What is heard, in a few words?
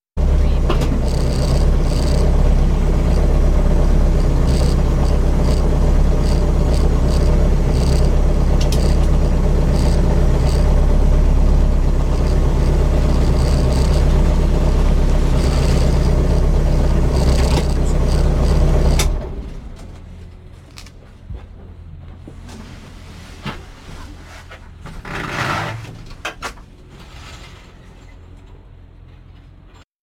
Pulling up and cutting the engine inside a rally car; beanstalk audio